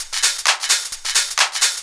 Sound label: hihat 2, 130, drum loops